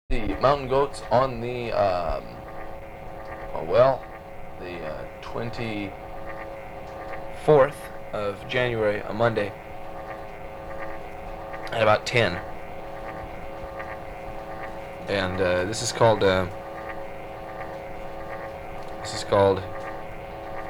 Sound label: rain song